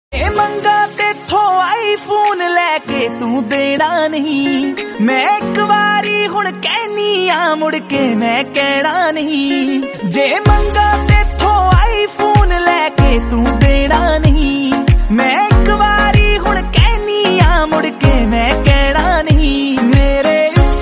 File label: punjabi tone ringtone